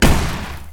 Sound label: Shotgun Firing